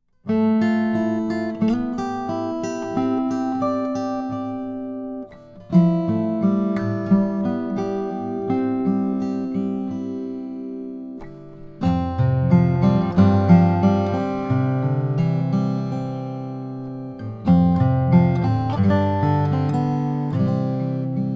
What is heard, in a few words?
charis sj brazilian rosewood